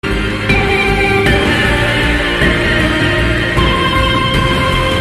Drop Music; Apex

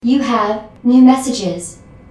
Message; RE 2

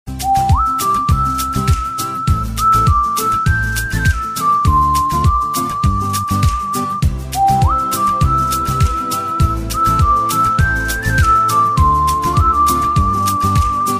Sweet Flute Music

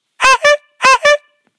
Double Horn

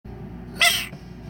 Froggy screeee

